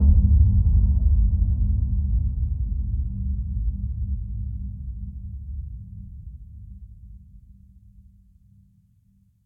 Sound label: boom; Level4 Hidden World